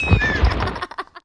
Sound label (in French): chute skieur feminin